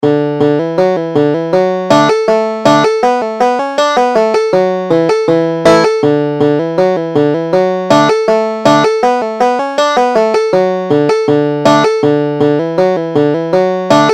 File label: rocky mountain hornpipe, banjo